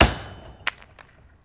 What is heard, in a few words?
Hockey Puck Goal Post Hit